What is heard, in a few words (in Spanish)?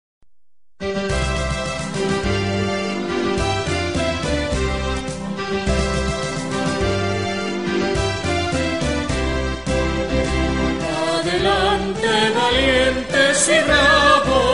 himno de chitaga